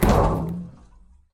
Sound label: Drop Jug